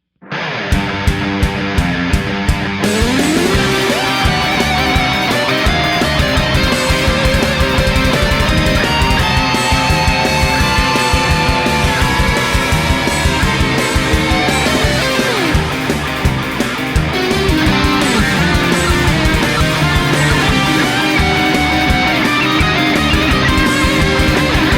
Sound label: Rock Cover; Super Mario RPG; FFIV